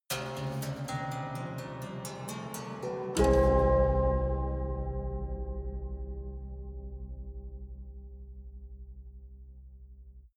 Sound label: haunted strings chromatic rise